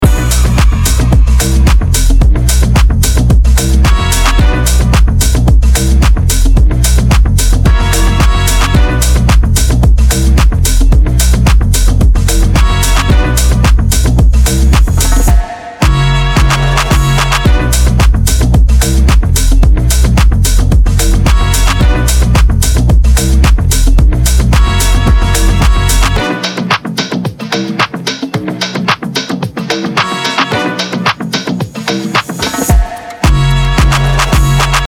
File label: Instrumental; BodyJam 101